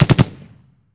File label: sand trike gun; Emperor - Battle for Dune